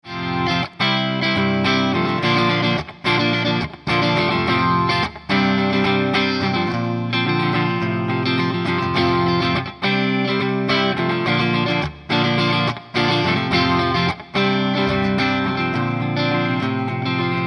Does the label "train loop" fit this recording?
No